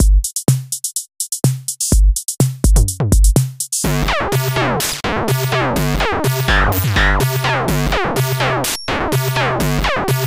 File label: Malfunction on Electro Beat